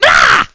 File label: boomerang activate